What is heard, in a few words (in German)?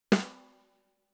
Snare Stimmungen und Mikrofonierung Sample Aufnahmen